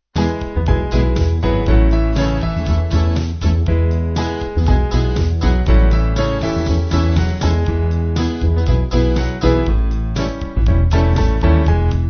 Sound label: Band